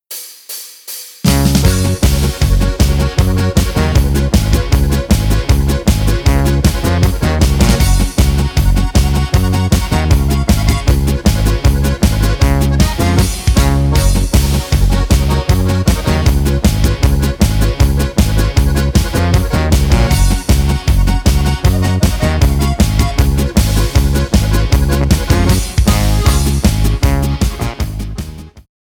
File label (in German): Polka